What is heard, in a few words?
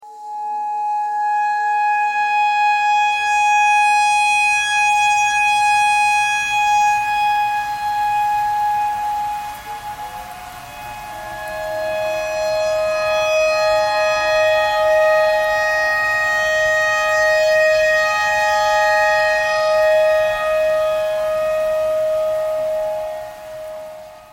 tornado siren clarinet notes e thread simple